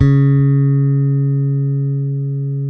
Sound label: bass sustain C#3; Sampleheads; Will Lee Bass Library